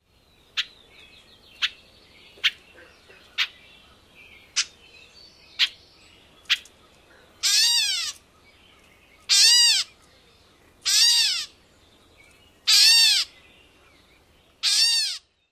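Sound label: kwut mews, Gray Catbird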